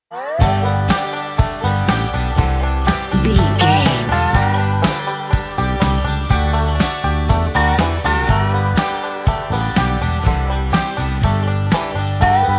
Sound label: Pop Country, Country, Rock, Country Rock, Bluegrass, Folk; Blues, Roots, Happy, Uplifting, powerful, intense, driving